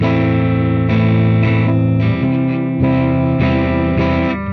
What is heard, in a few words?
GTR